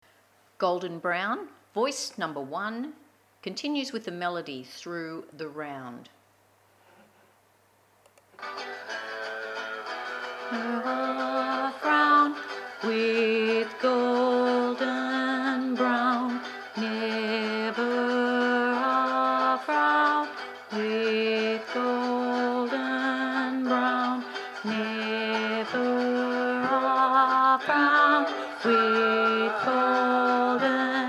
voice melody

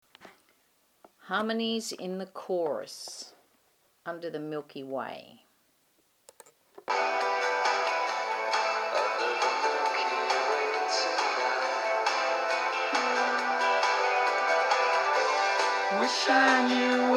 Chorus harmony

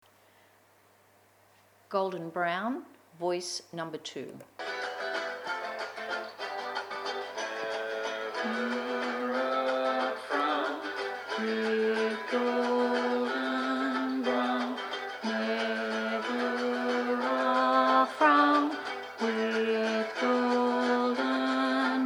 Voice high

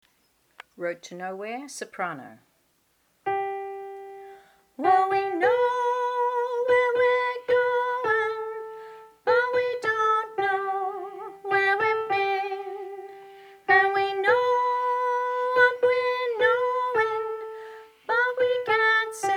Soprano

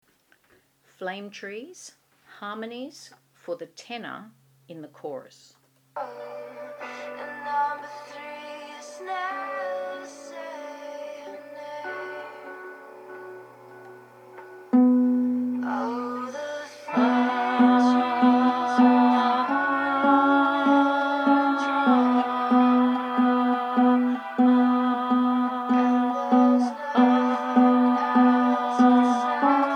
Tenor Chorus

